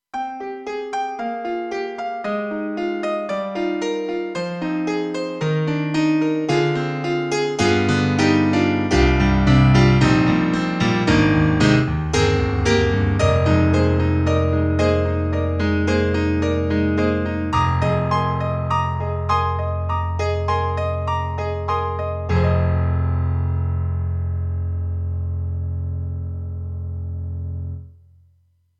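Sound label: Euro Grand, piano; KORG EXB-PCM08; TRITON-Rack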